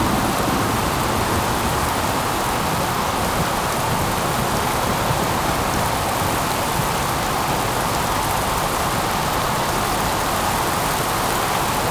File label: Rain very heavy; ambience; Project Gotham Racing 2